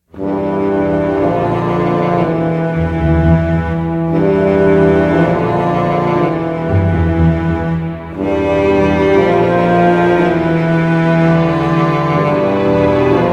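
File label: Campaign Music; Heroes of Might & Magic III HD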